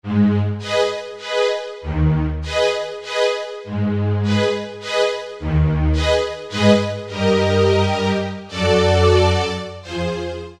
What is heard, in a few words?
Sectn, Yamaha TG500